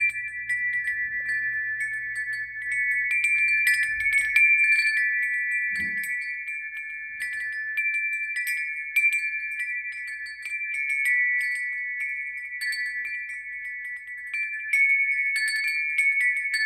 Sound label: Silver Wind Chimes; Atma Buti